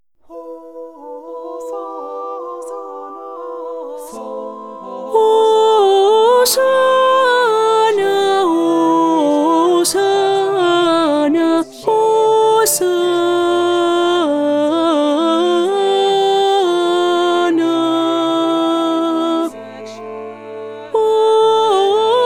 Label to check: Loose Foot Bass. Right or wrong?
Wrong